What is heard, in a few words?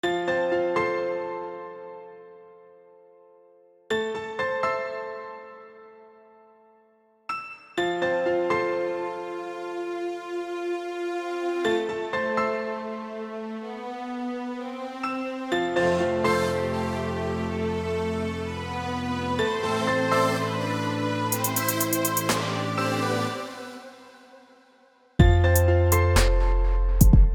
electro classic